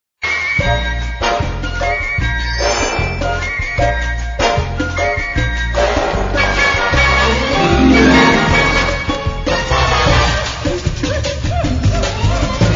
Nice Ringer

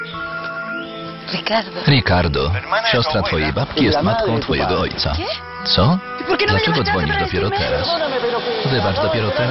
Telenovela Comedy

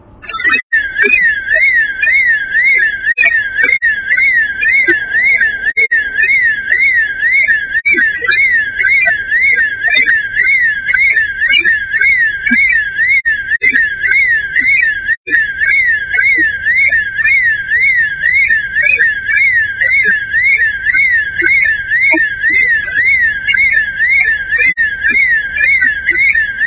Car alarm

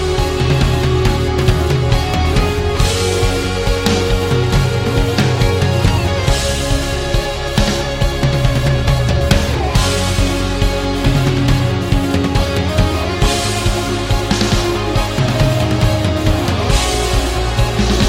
Epic Guitar

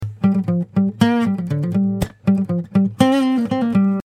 Acoustic Guitar

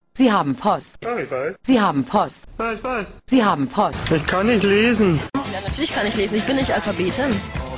Best Sms Sound Ever

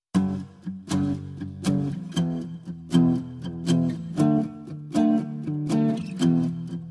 Guitar Msg

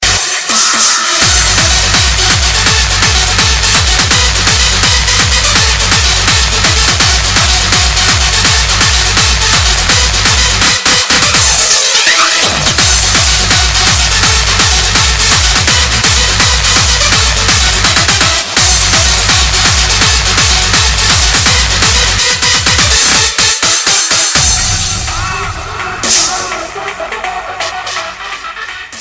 Hard Dance

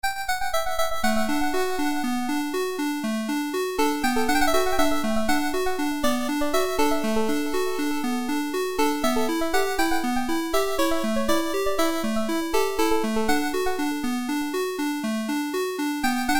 8bit

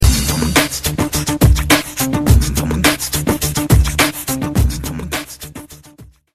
Msg Beatbox

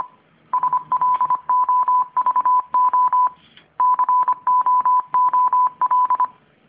Speaker Morse Effect

